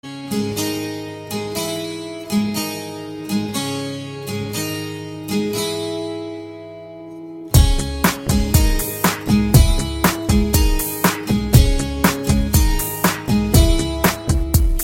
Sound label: Instrumental Songs